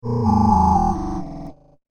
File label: Descending Whoosh